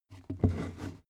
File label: step wood turn